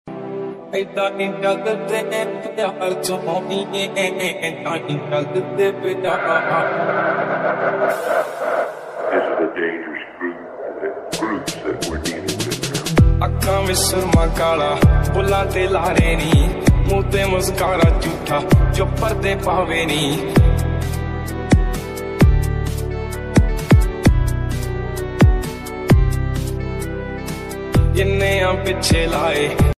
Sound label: love ringtone attitude ringtone bgm ringtone tamil ringtone